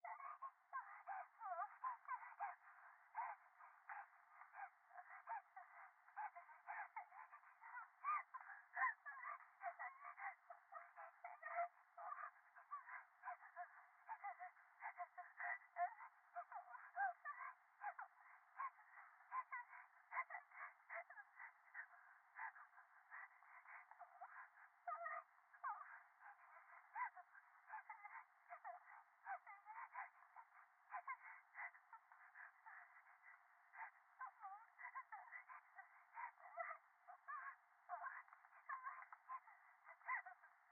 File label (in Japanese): 電話 可愛い喘ぎ 山奥